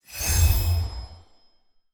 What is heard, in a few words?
magic